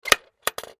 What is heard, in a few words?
Latch Object Device Lock; Latches (Sound Effects:Objects); Foley; Blastwave